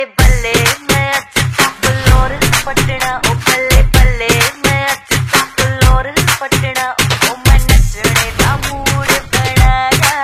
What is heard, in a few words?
bollywood ringtone